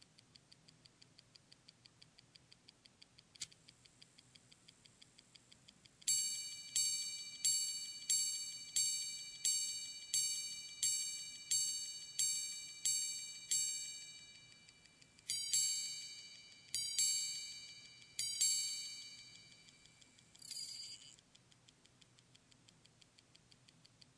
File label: Grande Sonnerie, 12H45; Greubel Forsey; Proto1